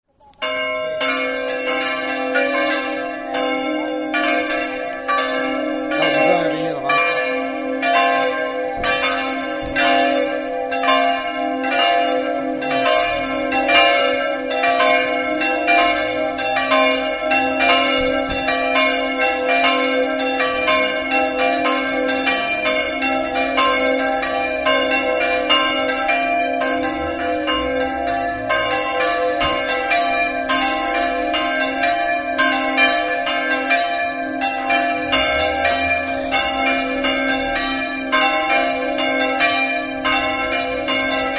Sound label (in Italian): murlis, campane